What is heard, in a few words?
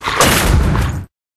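Rat attack mid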